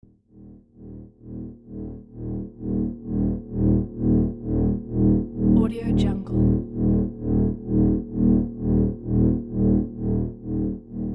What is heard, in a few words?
pulsating energy